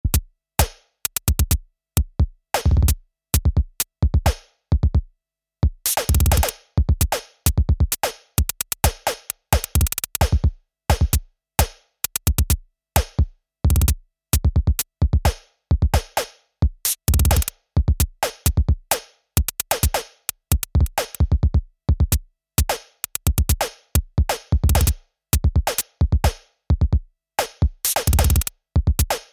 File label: Beat Chances; Five12